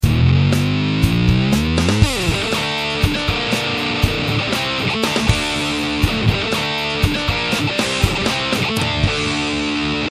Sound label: Heavy SD1 JCM900